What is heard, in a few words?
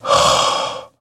Werewolf Breath Airy